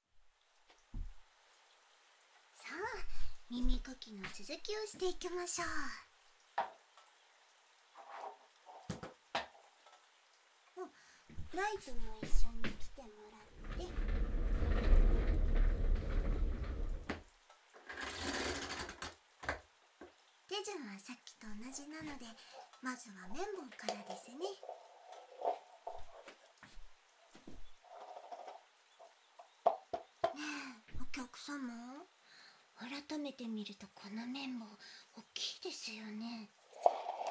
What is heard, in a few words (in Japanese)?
左耳かき; あっさり耳かきコースーヒーリングサロンシエルー